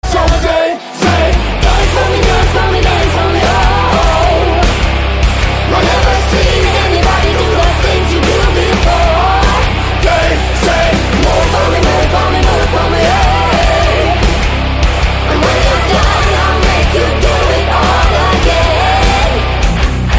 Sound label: Dance metal